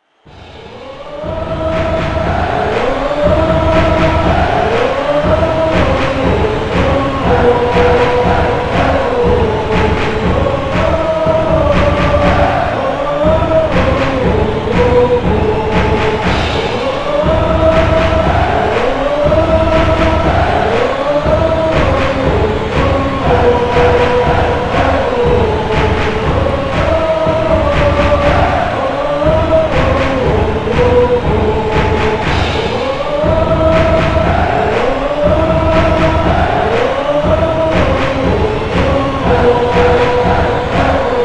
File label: Chants, When The Saints Go Marching In